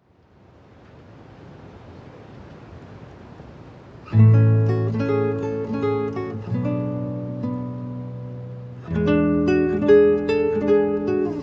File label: Guitar